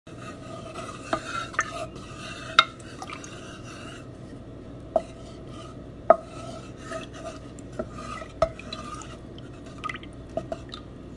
stirring cooking pot

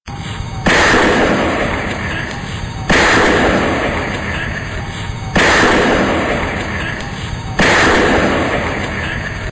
gun shots

